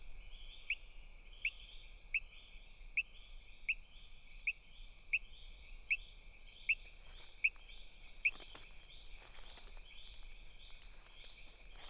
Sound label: Adenomera andreae; ECourtois